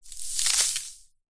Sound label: sand handful drop ground; foley; zapsplat